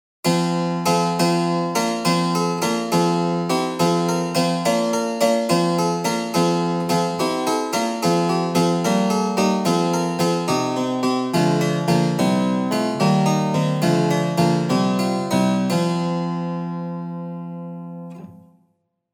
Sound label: Italian Harpsichord; Cortesana Padoana; edition-beurmann